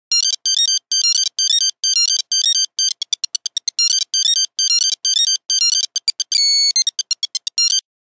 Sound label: Treadmill, Nokia Buzzer; Windows Phone apps